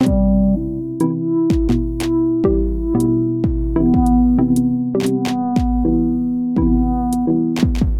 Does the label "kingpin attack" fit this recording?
No